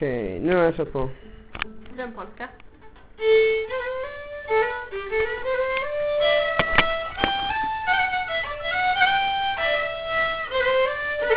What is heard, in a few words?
polska